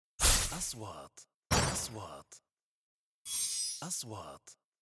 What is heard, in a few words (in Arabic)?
نغمة اندفاع هوائي سريع; transitions